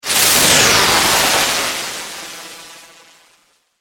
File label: Electrical Shock, Electrical, Shock, Wave, Warble, Science Fiction; 5.1